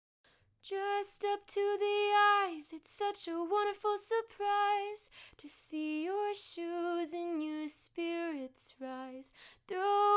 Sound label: sing; Razer Seiren Mini